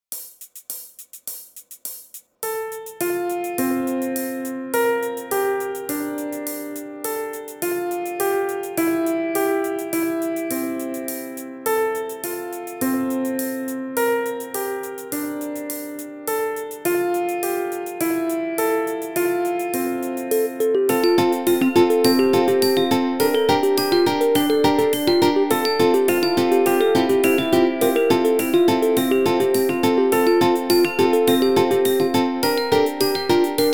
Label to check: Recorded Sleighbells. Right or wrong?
Right